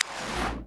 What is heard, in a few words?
Space Minimize